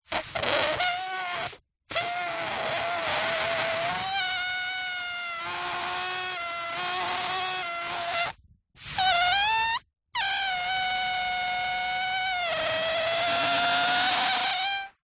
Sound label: foley balloon air release slow squeak